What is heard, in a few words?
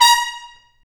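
brass trumpet stab; Roland L-CDX-03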